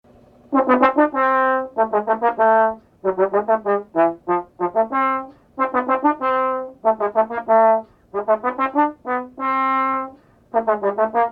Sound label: trombone polka